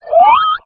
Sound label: alert, houndeye